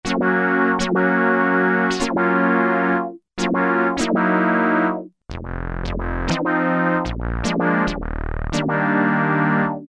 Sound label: Korg Poly-800, Echo Synthe